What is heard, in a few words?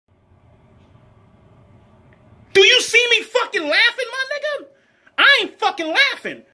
not laughing; edp